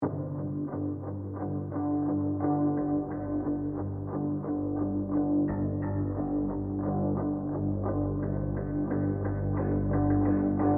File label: Spleen Ambient